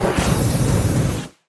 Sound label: tower dwarven Flamespitter basic Attack cast